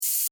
Servo Mechanical SciFi Robot Toy; Scifi Servo F Mechanical Robot Small Motor Toy; BBFX

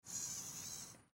Sci Fi Servo Motor Small Robot; Servo Motor Small Slow

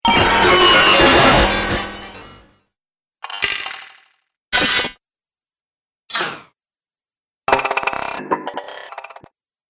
Droid Variety, robot; Variety Sound Pack 01; Robot Sound Effects

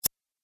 Scissor Snip; Office Sound Effects

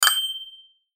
chime click; Multimedia; Chimes; Blastwave

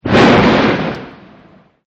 Volcano Erupt; Nature Scenes; Environmental

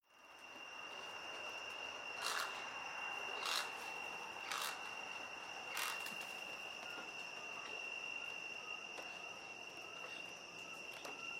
Marsh Ambience Night Spooky; General evening outdoor ambience, slightly spooky night time under the open sk; Environmental Sound Effects

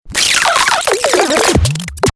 Turntable Scratching Reverse; Dj; DJ (Sound Effects:Musical)

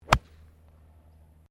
GOLF SWING IRON SLICE; Sports

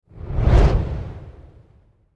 Fireball Burst, Fire Burst Flame Blast; Flame Sound Effects; Sound Design

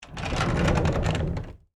Industry, Cart, Roll, By, Wood, Surface; Rolling Sound Effects; Objects

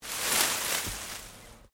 Leaves Move Medium; Material components for constructing more complex sounds; Rustling; Objects

